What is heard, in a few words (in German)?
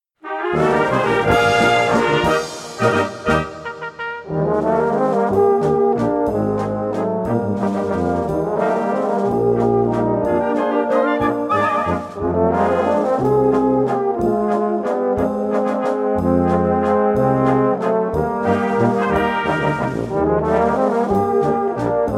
Bayerische Tenorhornklaenge